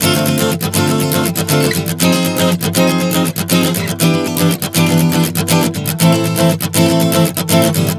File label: Dm-Fm-Em-Am 120 bpm, acoustic guitar chord progressions